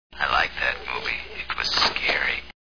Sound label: scream